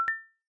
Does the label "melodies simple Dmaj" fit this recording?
No